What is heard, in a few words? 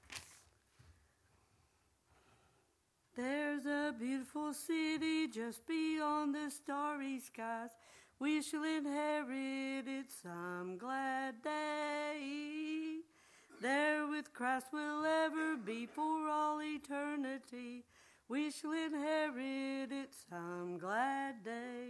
singing